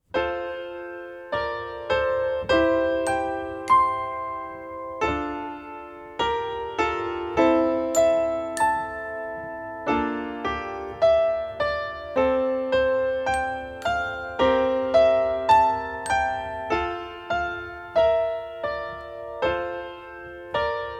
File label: distant bells prelude music only